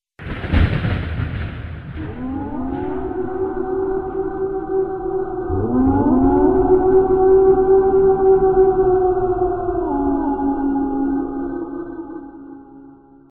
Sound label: halloween horror ringtone